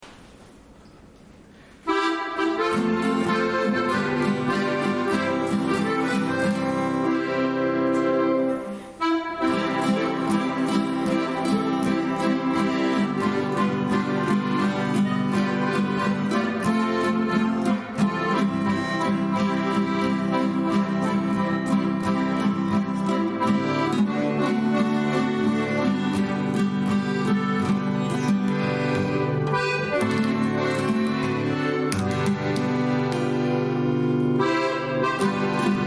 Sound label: instrumental